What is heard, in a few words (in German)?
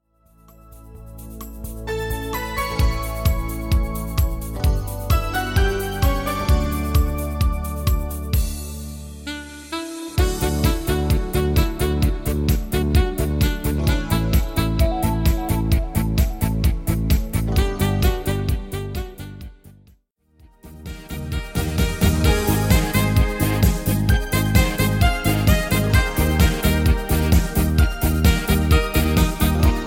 Discofox deutsch